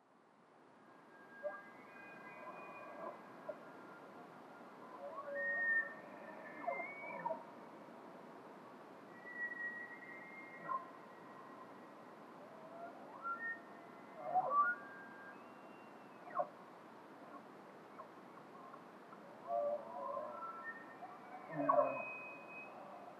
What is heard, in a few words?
Elk chorus